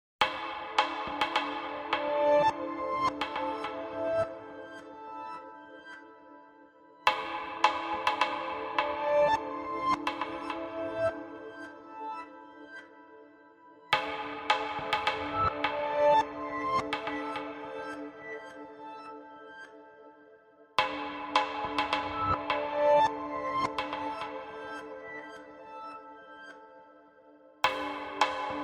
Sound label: Passing Moments without Marimba